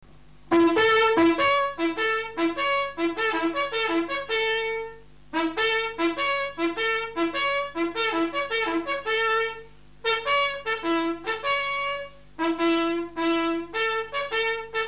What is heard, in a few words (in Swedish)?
igenkanningssignalen